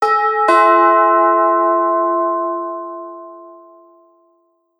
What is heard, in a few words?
Antique Ding Dong; Ring; alert tones